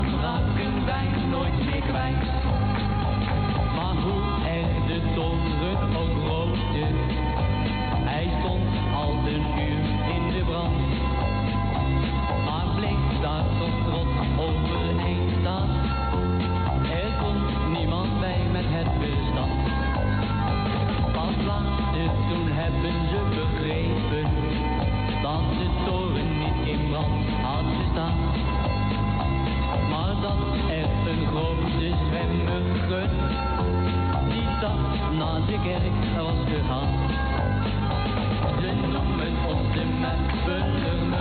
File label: sdr 1098khz 10watt pep; swcholland